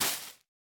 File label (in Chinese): 孢子花踩踏声